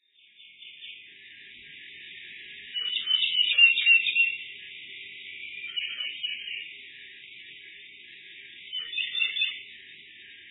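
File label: Ryukyu Robin; akahige; tokara; koe; seki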